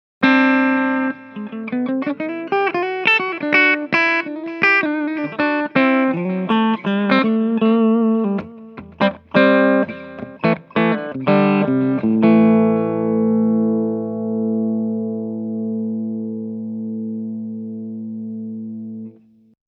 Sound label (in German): steg clean